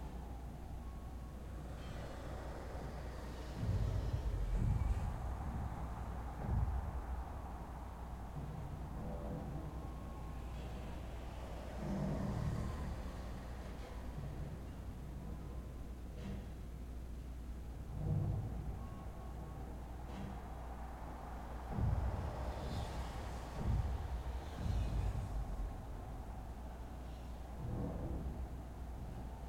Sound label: amb captured black; noxus